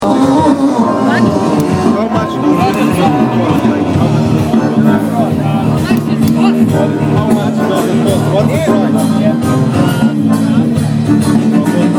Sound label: Serbian Jazz Electronica